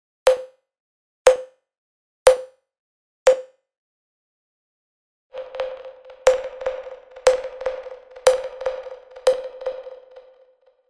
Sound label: Cubase Pro 10.5 Multi Tap Delay